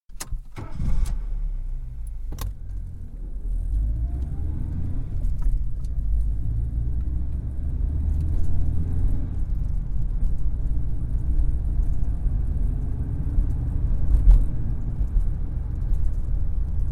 Vauxhall Carlton, interior, switch on, constant run, switch off (18 litre saloon); Vauhall Carlton, interior, switch on, constant run, switch off (18 litre saloon, 1986 model); BBC